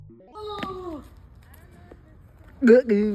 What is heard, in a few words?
goofy oof sound